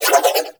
Nunchaku Prime Spin Whoosh; Warframe; Tenno

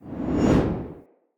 Long Sword Accent; Weapons; Mother

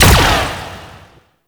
Galleon Turret Fire; Warframe; Ambience; Grineer Galleon; Vor Galleon Fight

